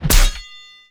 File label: Weapon Impact Parry, Medieval Armor; Impacts; Double Trouble Audio